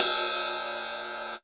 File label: DRMSND, Pure Electro, BEATKIT